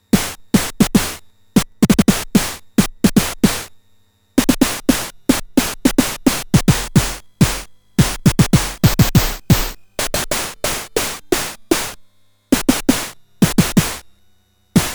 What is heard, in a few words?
SID SNARE